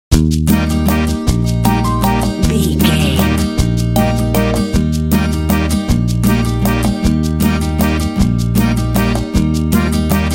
accordian befriends guitar

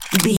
gun rifle magazine load

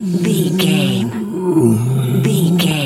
bear roar soft

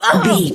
voice female hurt pain

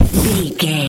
fireball impact burn

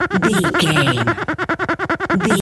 cartoon squeaky cleaning loop

